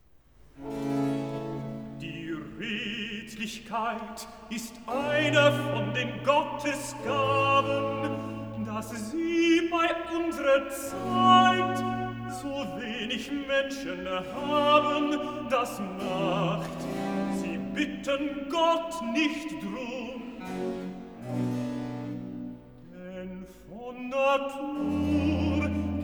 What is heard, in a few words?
Recitativo Tenor; Die Redlichkeit ist eine von den Gottesgaben; Bach; Cantatas; BWV 24; Ein ungefarbt Gemute